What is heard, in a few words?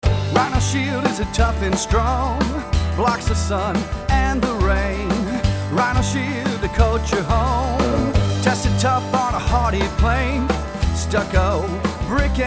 Jingle; Rhino Shield